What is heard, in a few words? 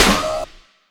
loud snare one shot D# key, Royality free snare drum sound tuned to the D# note.; snare drum samples; one shots